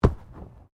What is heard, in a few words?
Body movement Organic Impact Heavy; Body movement foley to simulate falls and deaths; Wrestling And Struggling Sound Effects